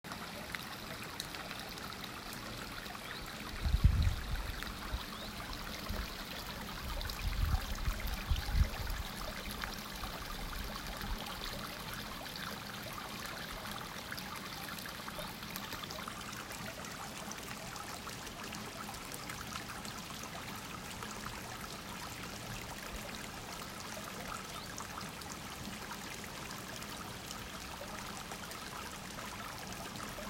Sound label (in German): Wassermelodie